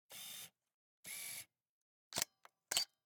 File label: Camera, Pentax KS2, DSLR, electronic, mechanical, focus, quick, snapshot; Eiravaein Works; Vaeyan IV